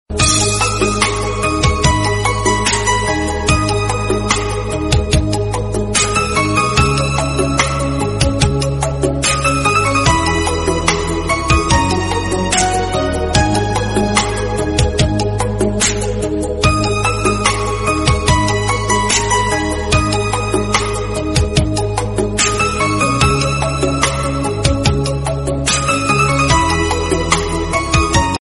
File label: soft romantic mobile ringtone